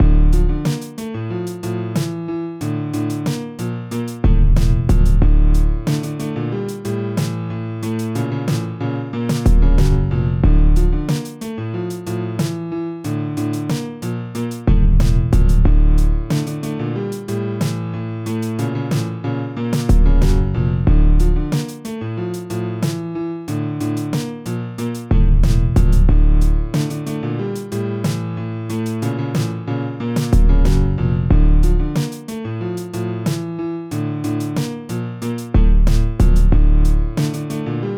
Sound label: Rap Piano